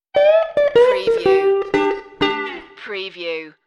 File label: silly slides stinger